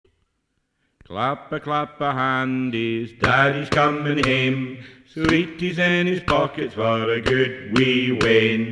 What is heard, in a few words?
Clapa Clapa Handies